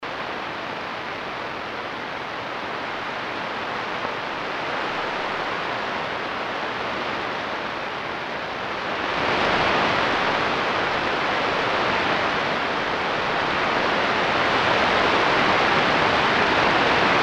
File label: Solar inner flare 22.21MHz; Ashcraft